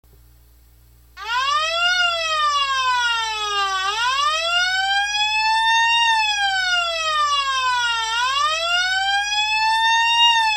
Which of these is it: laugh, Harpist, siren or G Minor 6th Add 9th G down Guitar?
siren